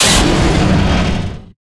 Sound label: Death